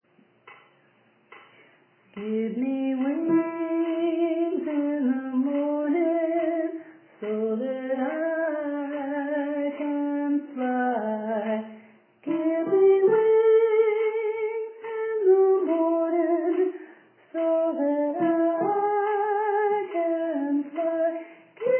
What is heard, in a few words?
Melody; OaxacaCanta